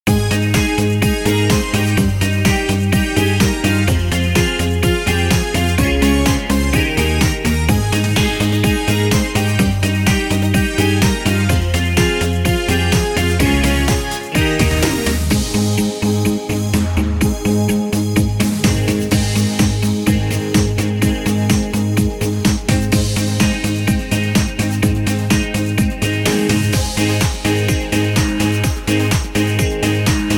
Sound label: modern schlager; wersi